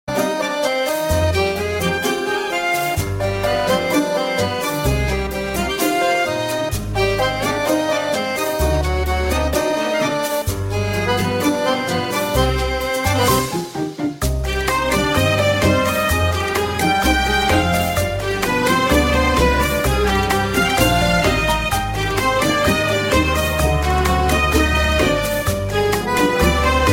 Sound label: Tango